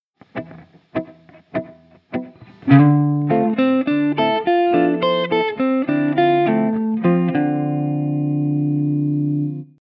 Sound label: dorian, guitar